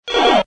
win mini add; games; poker; American Poker The Legends II

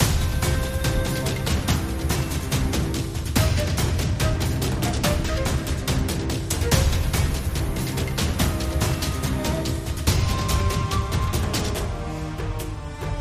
freespin ambiance; Wild Ape

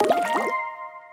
win multiline; Hungry Shark; Wazdan

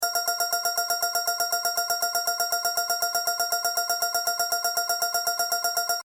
Count Small Loop; Fruit King; CQ9

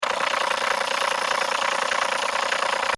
roll; Paradise

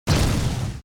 UI bomb; Paradise

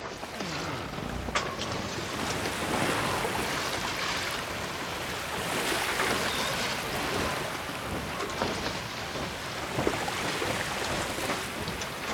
gambling ambient; Hungry Shark; Wazdan

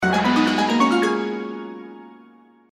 grow; Journey To West

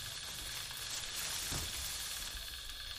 reel spin; Wild Ape

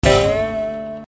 hit; ChiYou

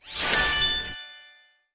megaboard triggered text dissappear; Racetrack Riches

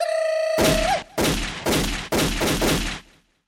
gambler symbol orange; Fruit Fiesta; wazdan

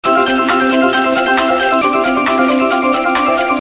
countup; WolfMoon

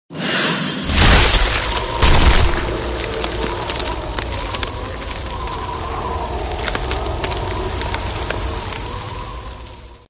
buff ice; Thunder Fighter

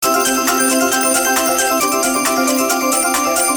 count up, slot; Hot 40

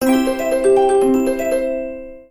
collect freeround; Dragons Gift; slot

